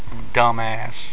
pain

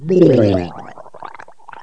DROWN; Morbo

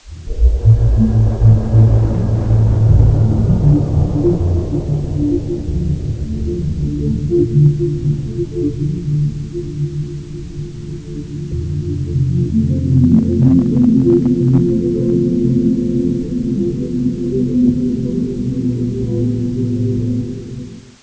wat atmos

